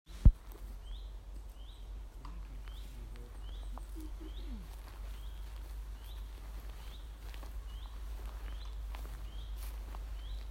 Bird in the for; forest

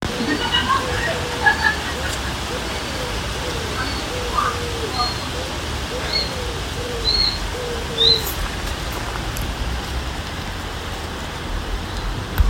Birdsong

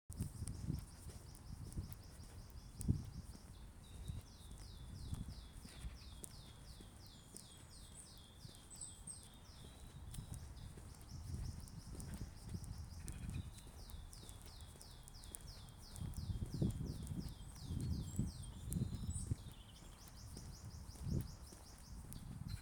Mystery bird

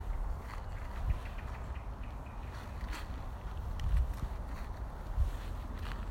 Robinswood Hill Blackbir

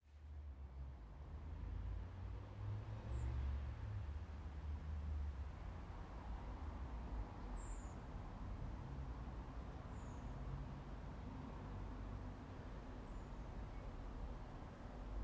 Redwings

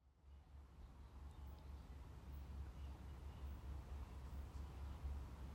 Bat

